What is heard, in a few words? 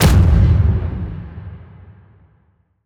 Coilgun, Weapons